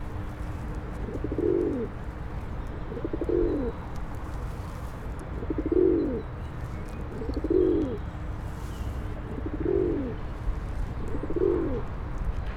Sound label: Columba livia; Rock dove; Male purring before a female; Order Pigeons (Columbiformes)